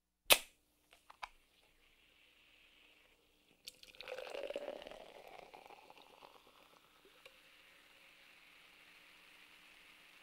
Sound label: Soda Can Opening Sound Effect